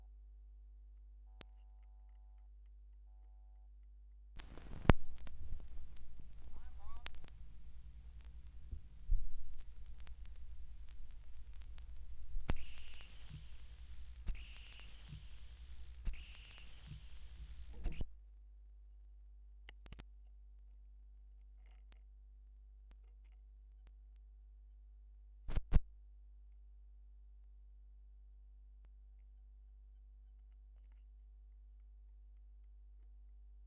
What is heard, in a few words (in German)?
schallplattengeraeusche; rauschpartikel